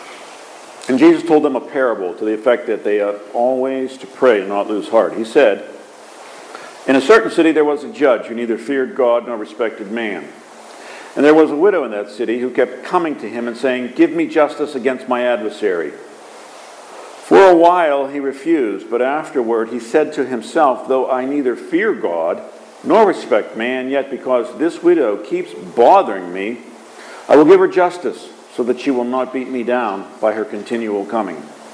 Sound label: Gospel